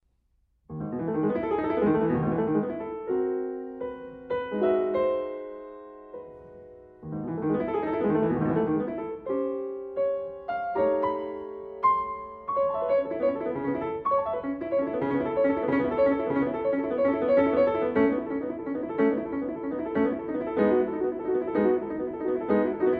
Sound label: Jazz Noir